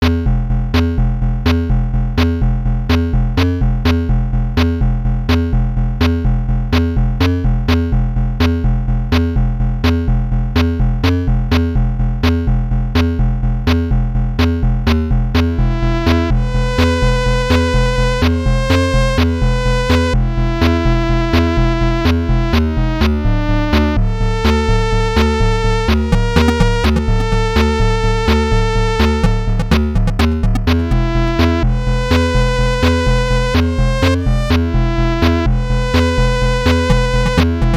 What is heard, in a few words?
dramatic looptyloop; Mandulin